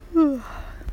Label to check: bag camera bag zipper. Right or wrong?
Wrong